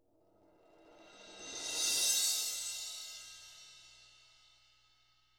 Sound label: ZILDP SWELL; ILIO; Double Platinum Drums 1